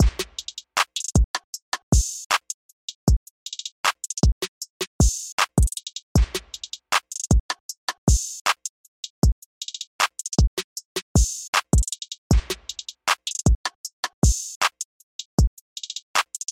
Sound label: Drum Loop 156